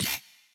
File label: wobble1